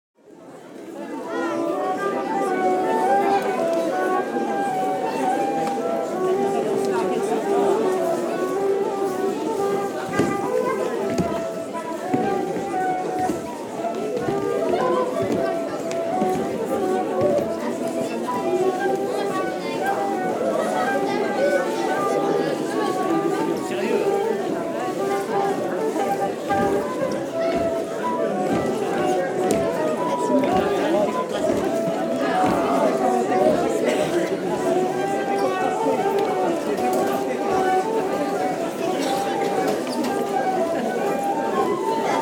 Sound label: bal flute